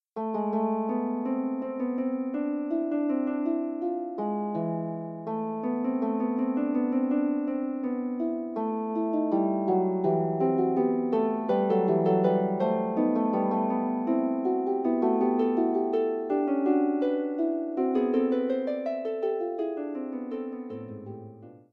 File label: Fugue, Harp